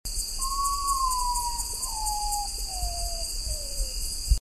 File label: Nyctibius griseus